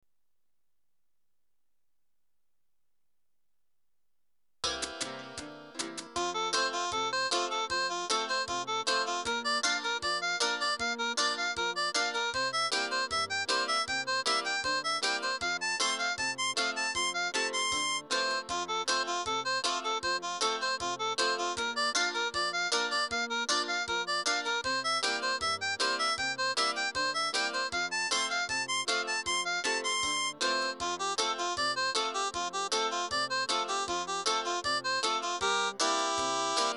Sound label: harp F; Old time